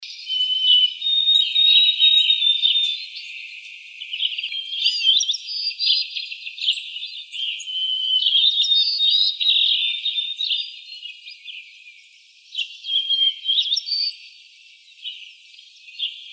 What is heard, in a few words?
Emberizids; AZFO; deviche